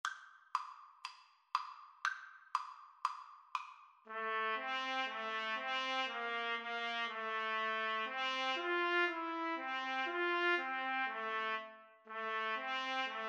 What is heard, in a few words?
trumpet duet